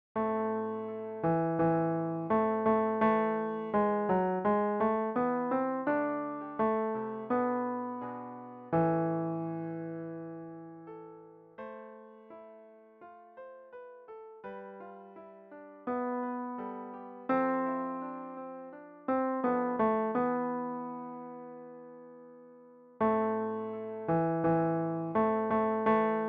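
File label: Tenor, MIDI; Lord, Make Me to Know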